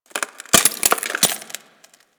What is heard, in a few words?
Ocean Glass Crack Small; Warframe; Grineer Ocean